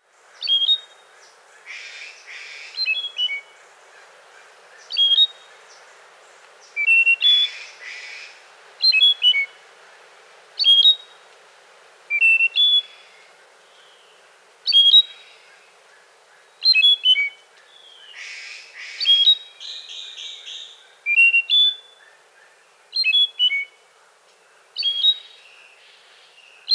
Song and call, Birds of the Costa Rican Cloud Forest